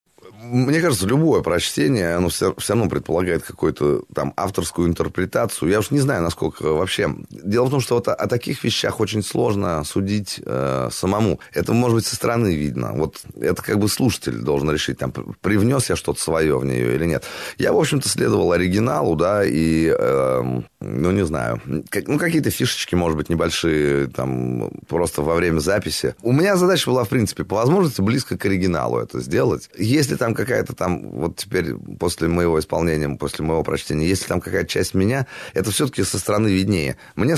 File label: song